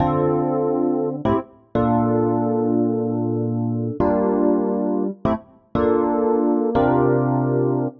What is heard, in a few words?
Chord El Piano Dm, 120bpm; Zero-G; Extra Chord Progs